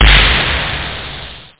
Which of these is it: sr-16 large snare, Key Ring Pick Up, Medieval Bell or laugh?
sr-16 large snare